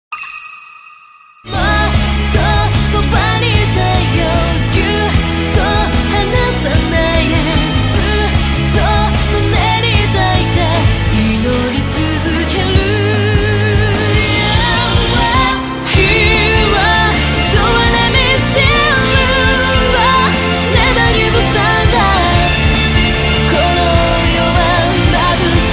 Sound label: song